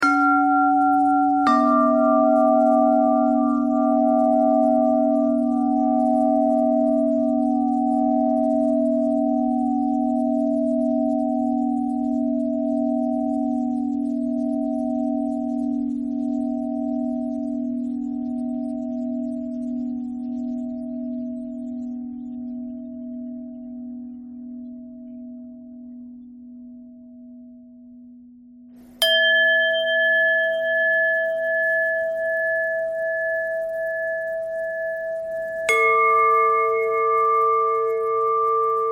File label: bell bell thread noise